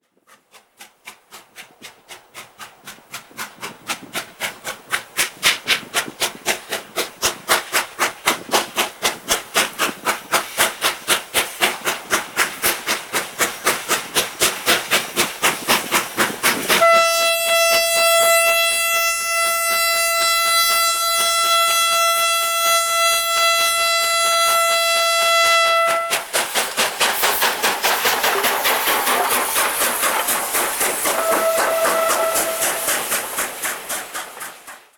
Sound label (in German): dampflok sound china